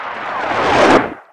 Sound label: mortar falling; explosive m224; garrysmod